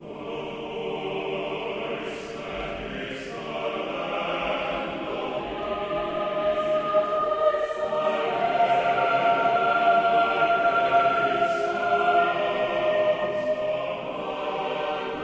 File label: singing extract